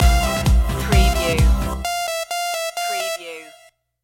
fresh and funky stinger

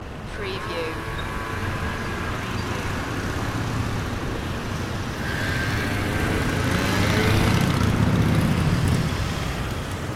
busy city intersection loop

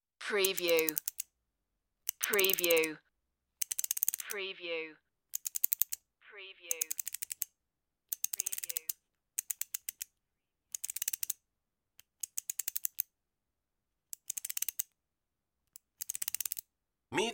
clicking screwdriver

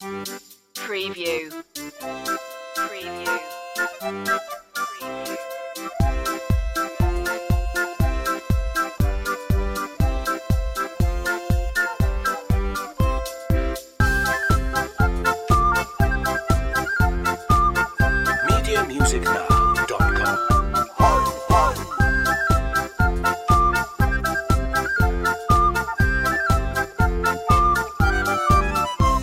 merry polka

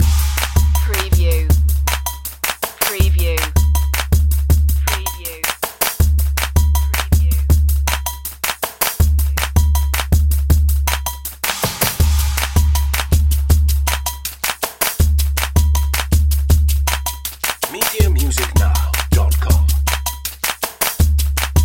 eastern pulses loop

